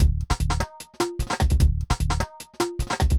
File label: bangra 150